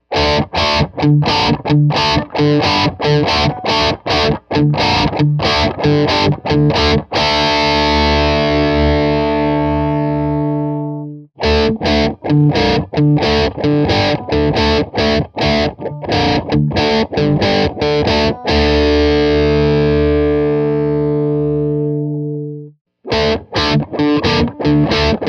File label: modern, pickups